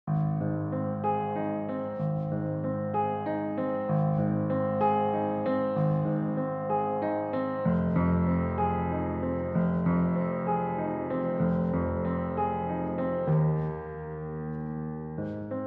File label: Piano